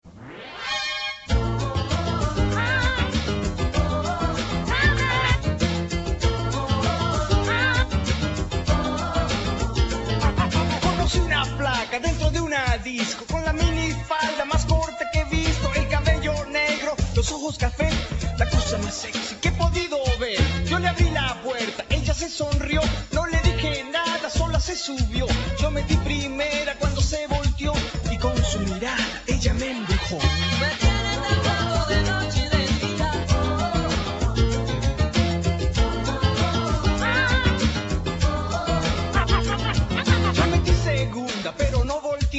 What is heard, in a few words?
rap